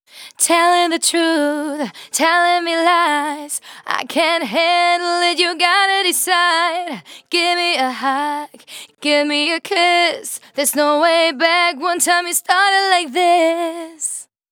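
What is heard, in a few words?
Female Vocals Verse DRY, 140 BPM; Vengeance Samples; VTDS2 Song Kits; Female Take Me Away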